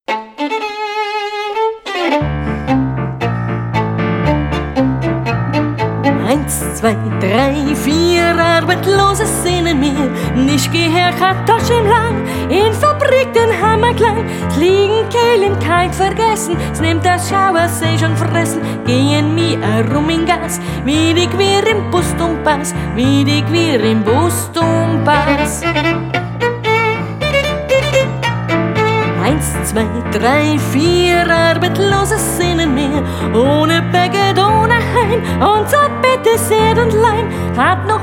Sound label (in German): arbetlose marsch, arbeiterinnenlieder; big apple